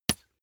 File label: bath duck hit